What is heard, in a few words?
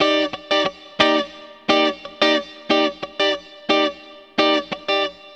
Jazzess; essentialsoundsII